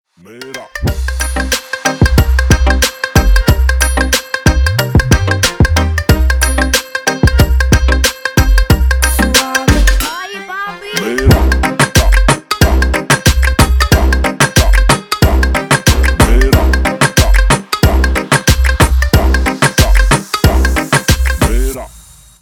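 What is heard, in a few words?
funny ringtone for mobile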